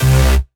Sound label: Basses Root C